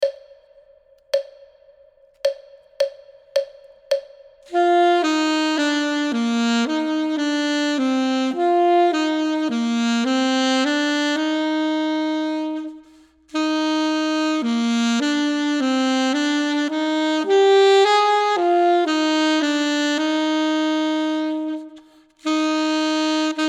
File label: duet part 2; Ten Easy Duets